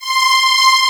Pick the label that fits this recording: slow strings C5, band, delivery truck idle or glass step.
slow strings C5